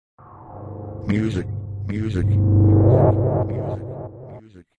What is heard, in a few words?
music